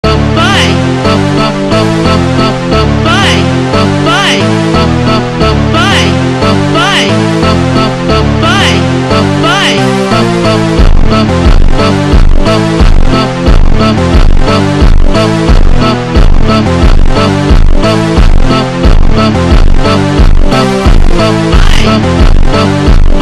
Phonk Automotivo Super Slowed

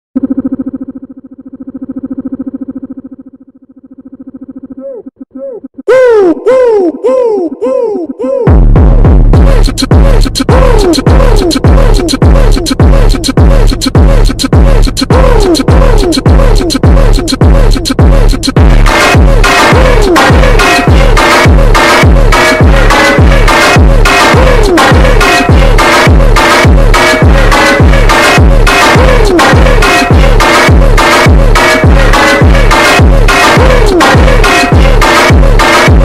Phonk

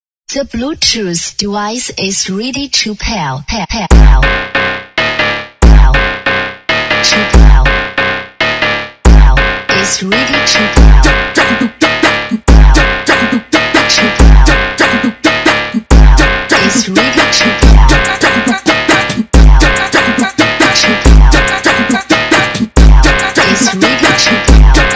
Phonk funk

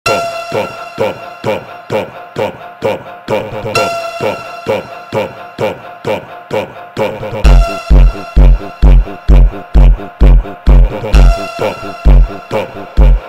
Phonk

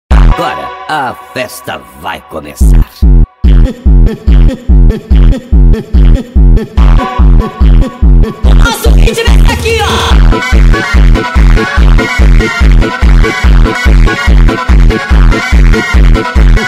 Phonk Sped Up

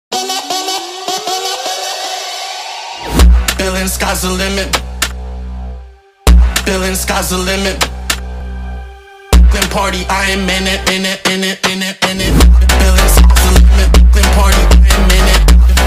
Phonk Brazilian Phonk Sped Up

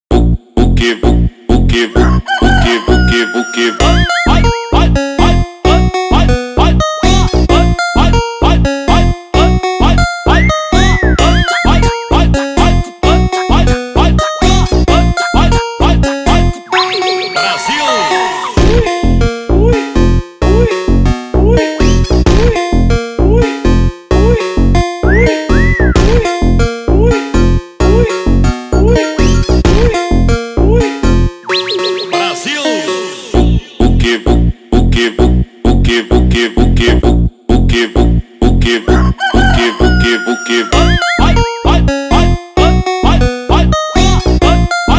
Phonk Automotivo

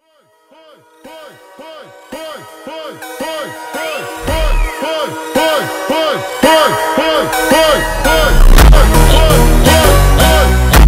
Phonk Slowed

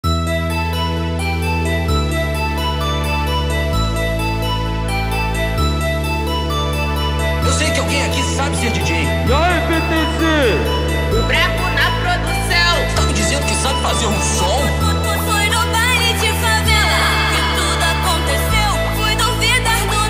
Phonk Montagem

